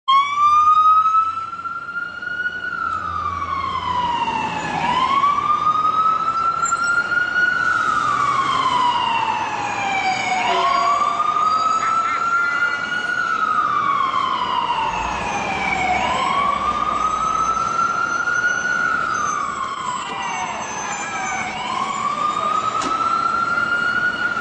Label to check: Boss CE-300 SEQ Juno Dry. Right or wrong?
Wrong